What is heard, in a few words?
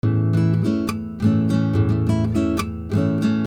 6/8 bandari